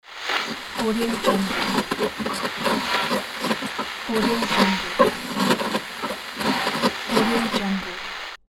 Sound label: tuning radio